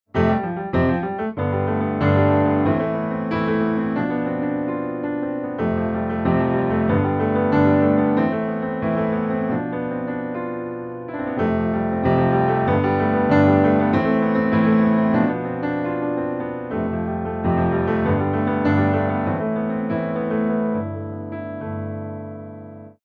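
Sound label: alarm piano; bao thuc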